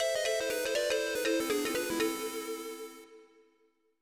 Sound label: Frogs Blown Away Losing Jingle; tokimeki memorial 3 yakusoku no ano basho de; ps2